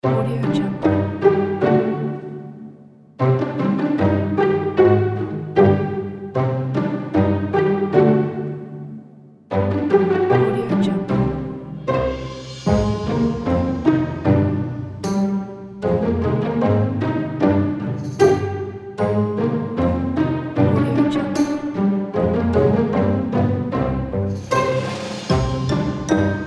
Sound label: the halloween comedy; plaincask